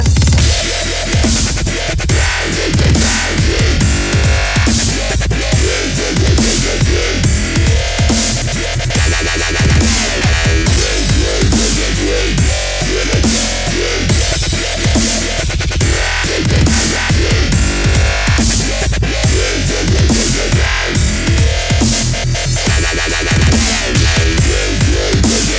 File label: Dubstepped